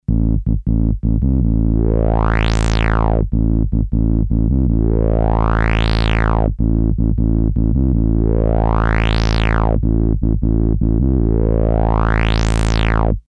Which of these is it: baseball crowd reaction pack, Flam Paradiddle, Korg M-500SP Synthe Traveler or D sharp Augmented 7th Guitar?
Korg M-500SP Synthe Traveler